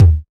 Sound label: low tom sample e key, Royality free tom drum sound tuned to the E note. Loudest frequency: 148Hz; tom drum samples